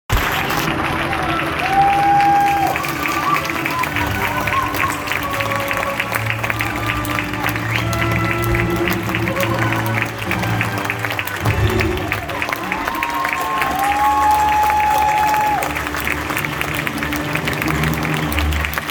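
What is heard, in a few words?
theatre music applause ovation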